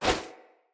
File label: Bow Melee Arrow Whoosh; Weapons; Player; Bows; Grand Father Bow